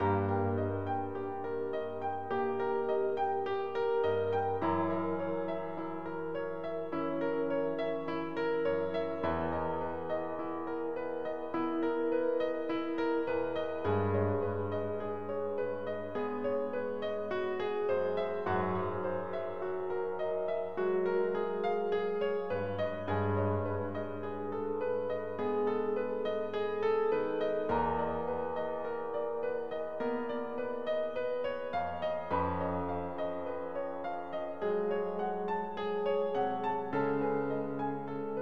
fugue

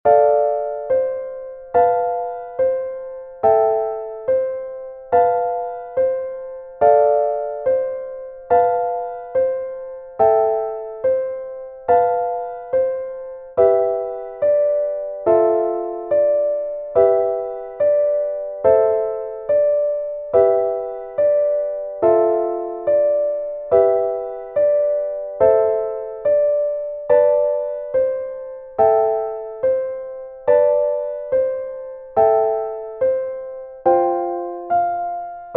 lullaby; satie erik

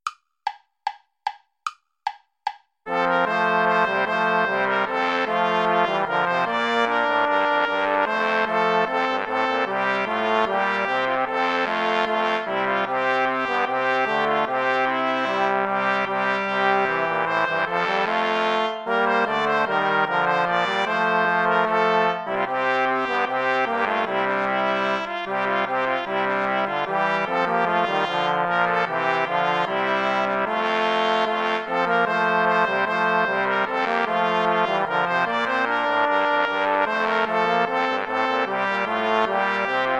bourree play along without trumpet; praetorius michael; bourree iii

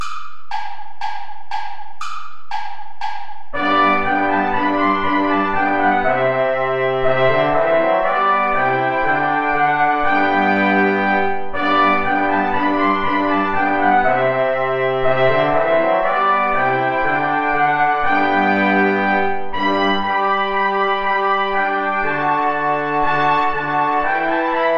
play along without trombone; steuerlein johann; mit lieb bin ich umfangen

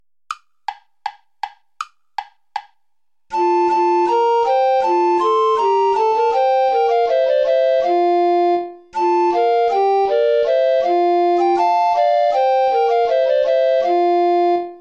play along without alto recorder; weelkes thomas; since robin hood

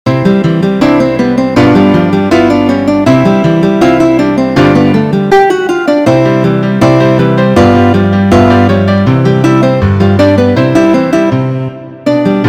rondeaux; giuliani mauro; giuliani wop14 rondeaux libro

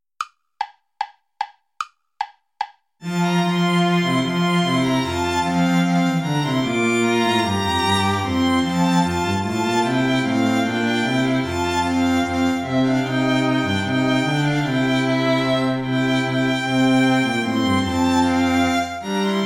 bourree play along without viola; praetorius michael; bourree iii

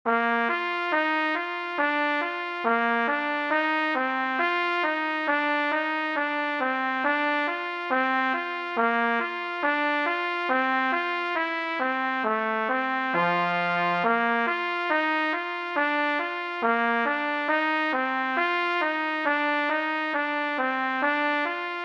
melody melodie; schumann robert

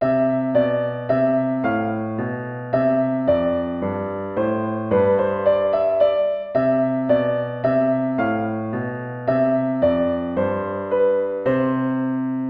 tempo minuetto; hook james

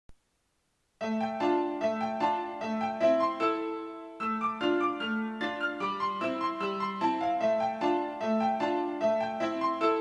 polka; polka minute

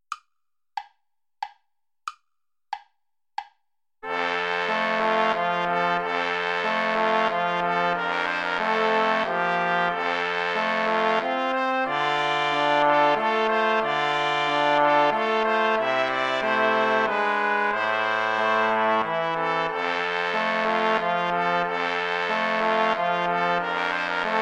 waltz play along without trombone

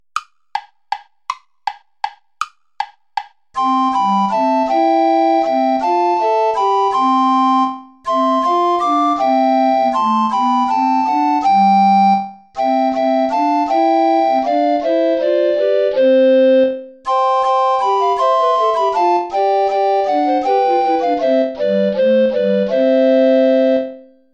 play along without tenor recorder; vulpius melchior; gelobt sei gott

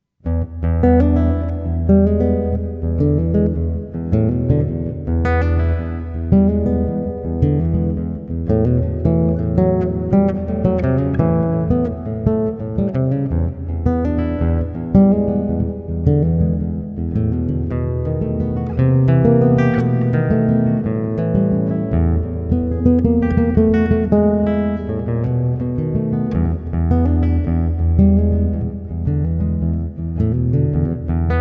blues

